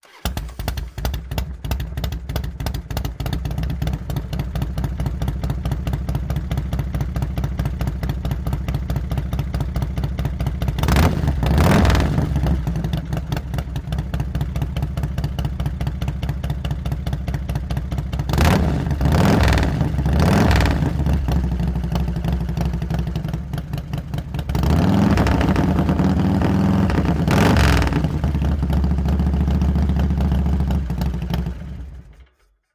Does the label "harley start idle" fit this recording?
Yes